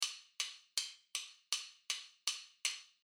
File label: 8 note